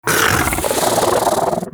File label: Bug Vocal Mount; Hunting Critters; Inf K Drive Bug